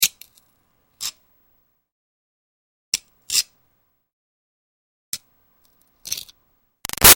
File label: Foley Rock Pick-Scrape Archaeology Small Grind; Rocks; Materials; Big Room Sound